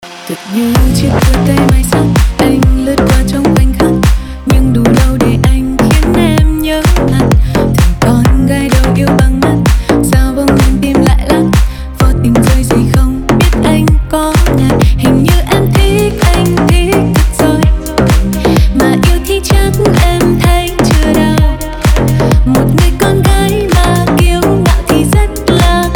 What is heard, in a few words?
slap house